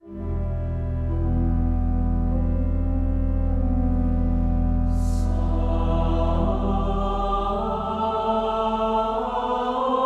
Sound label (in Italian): voci